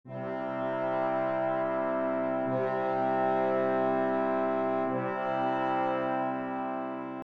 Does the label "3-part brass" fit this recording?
Yes